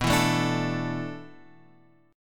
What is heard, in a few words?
D Suspended 2nd B; strummed